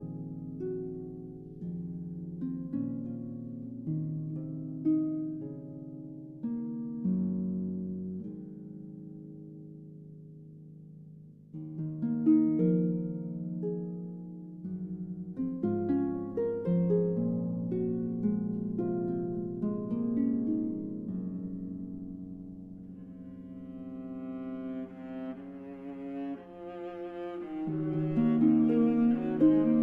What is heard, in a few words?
Harp Concerto Lullaby